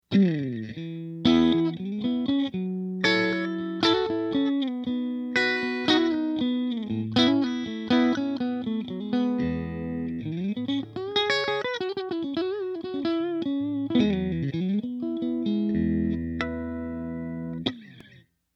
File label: stingray middle and bridge, single coils